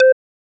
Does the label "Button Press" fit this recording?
Yes